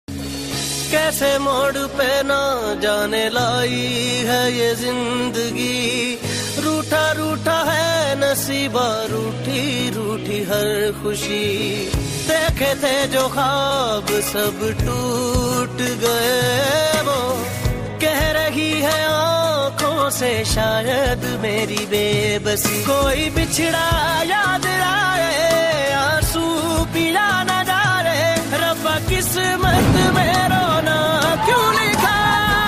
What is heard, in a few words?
Sad Song Ringtone